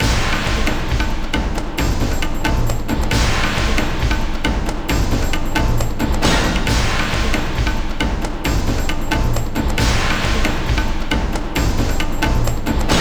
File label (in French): Rythme; Odyssey The Search for Ulysses